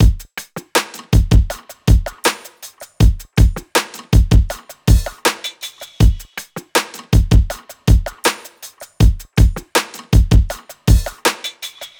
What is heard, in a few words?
drum loop 80; Pop; Drums; Full Drum Loops; Slate; SD PASADENA Pop Sample Pack